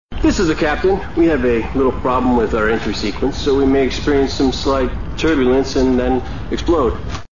Explode

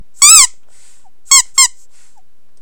Rubber Toy Sound

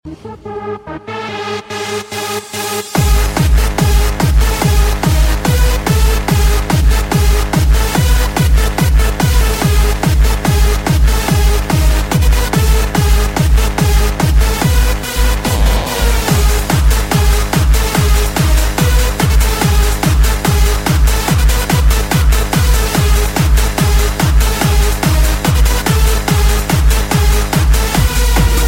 Hardstyle Tone

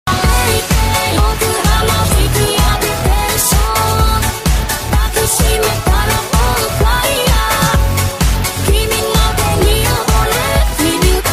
Game Over

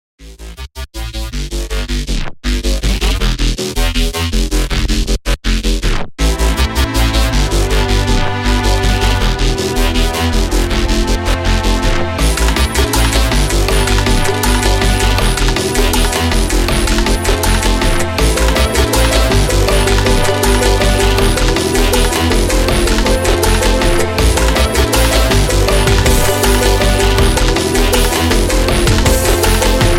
Future bass

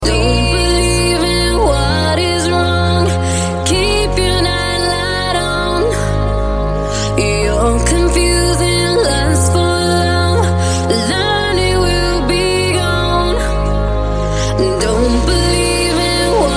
Vocal Trance

